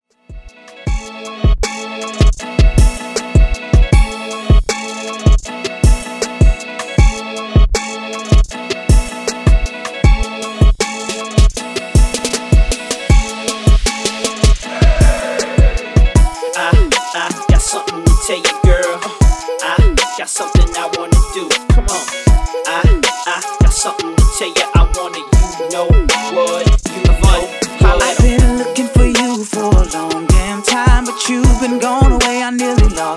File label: Old School, 157 Bpm; Redrum